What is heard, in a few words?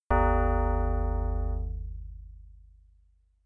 Coin; Indiana Jones and the Temple of Doom